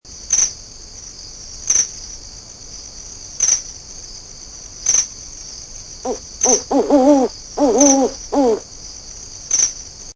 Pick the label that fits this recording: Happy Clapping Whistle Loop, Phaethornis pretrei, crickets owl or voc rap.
crickets owl